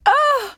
Emote Female Scared; gamerip; Idle Champions of the Forgotten Realms

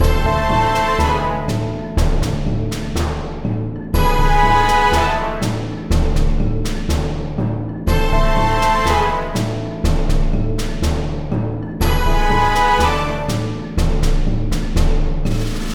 Game Lose Jingle Loop; Kitaria Fables

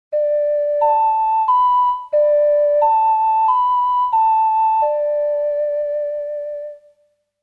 Ocarina, Lullaby; The Legend of Zelda: Majora's Mask

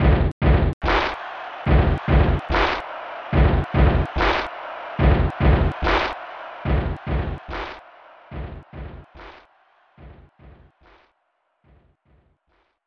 Stomps; brett hull hockey; snes

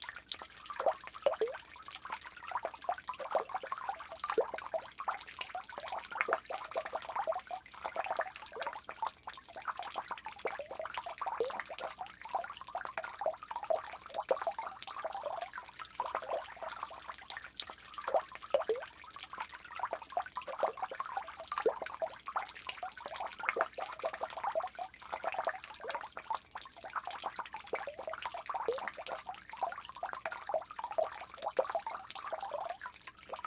Onsen Fountain Loop; Devour; The Inn

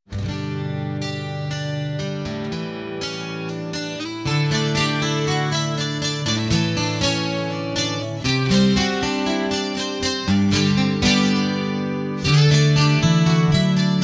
British Rain; The Works

